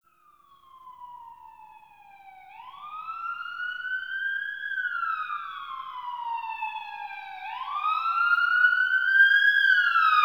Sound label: Exterior Distant Ambient Siren B; Warframe; Ambience; NineteenNinetyNine